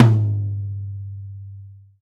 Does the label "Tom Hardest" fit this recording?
Yes